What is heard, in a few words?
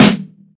Acoustic Snare F# Key, acoustic snare sound tuned to the F# note